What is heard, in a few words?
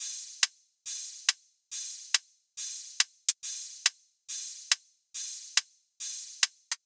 Riddim Drum Loop Top (140)